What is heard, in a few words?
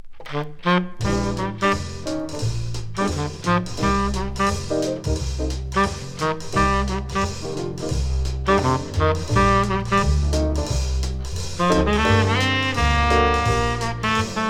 SAXOPHONE